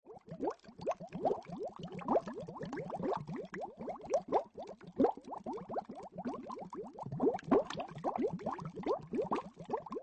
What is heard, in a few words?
bubbles